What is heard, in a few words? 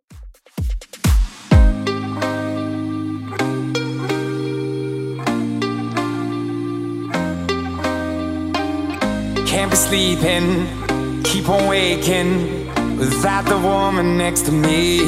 2ks Redrum 128bpm